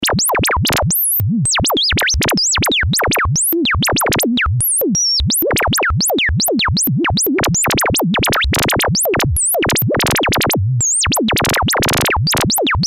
tri square cosrand VC; Quad lfo

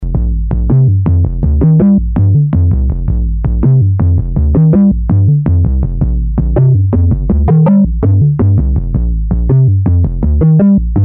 bassorama, Quad EG

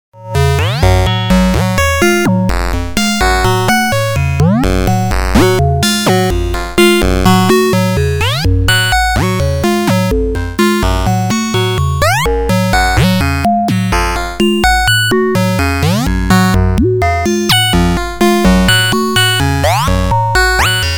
waveseq

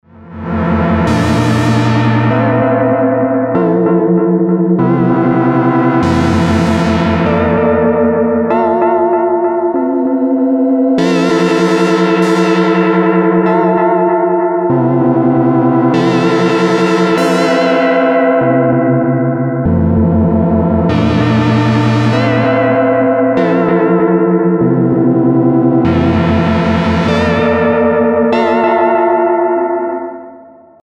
jazzy; TriVCO

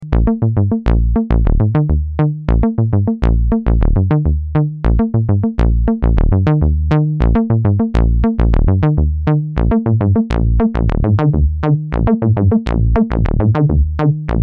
24db; 48dB LPF

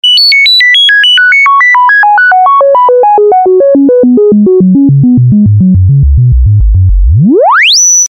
sinewave

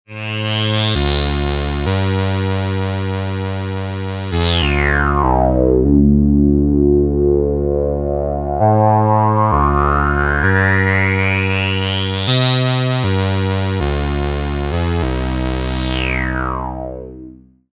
lowpass mult

